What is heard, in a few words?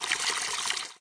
Garden Water